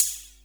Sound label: ohh, hihats; house; PS-28 Vocal House Samples; Drum & Perc